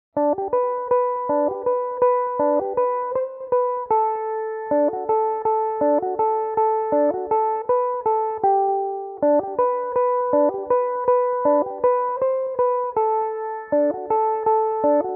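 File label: G major, irish mandolin, tunes; shoe the donkey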